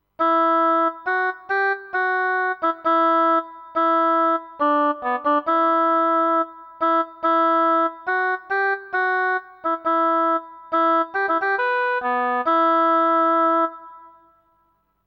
oboe main melody

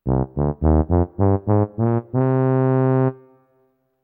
tuba short

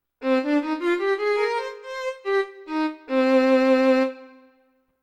violin short

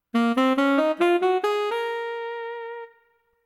saxophone short

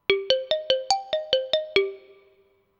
xylophone short

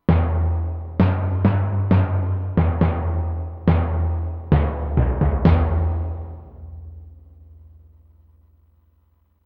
timpani main melody

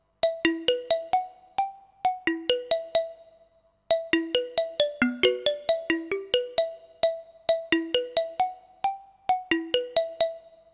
xylophone main melody

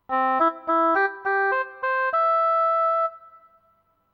oboe short

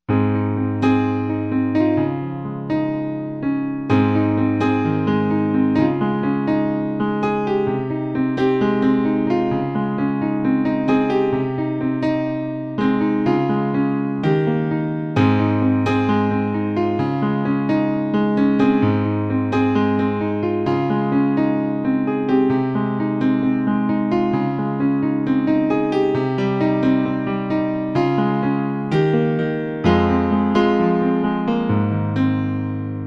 Piano Sketch

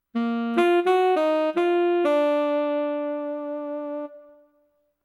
saxophone short

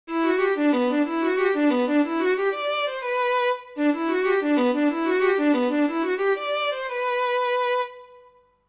violin main melody

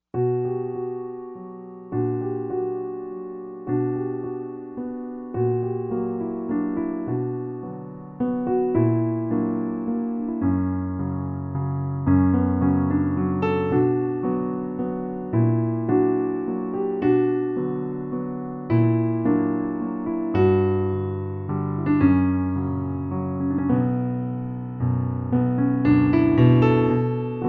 Piano Sketch; RainySunday

